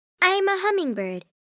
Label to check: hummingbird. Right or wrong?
Right